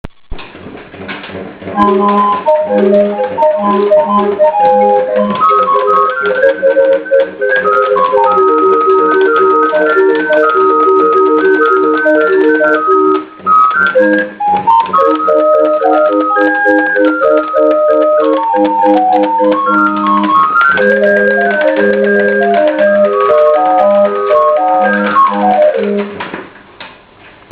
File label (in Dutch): flotenuhr muziek